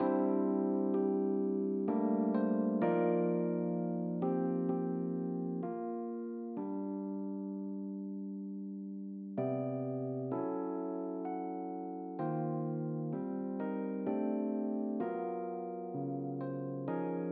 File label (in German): Glockenwerk